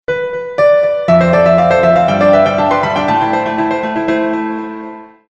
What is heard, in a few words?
Jingle